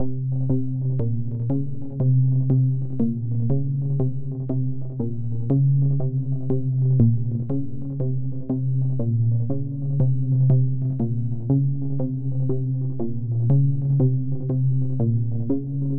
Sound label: Techno